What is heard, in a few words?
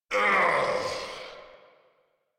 Death, Grineer Male